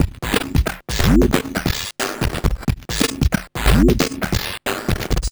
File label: up beatscope 090bpm, downbeat dance grooves; Zero-G